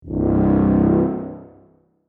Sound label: low tuba